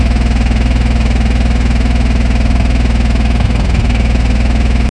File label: loop car engine sound mor; vehicle